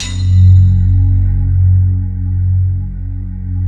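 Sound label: synthesizer D50, digital; Sound & Vision - Gigapack I CD 2 (Roland)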